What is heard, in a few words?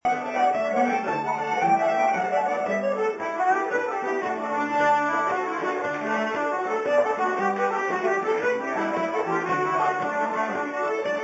trad Irish music; at the spotted dog